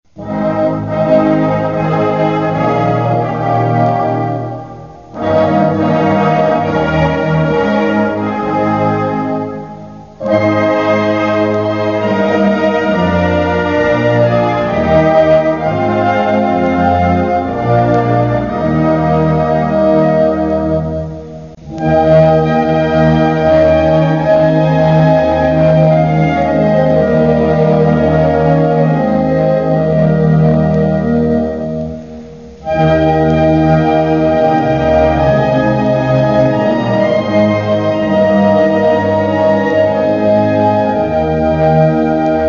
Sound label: National Anthem; Switzerland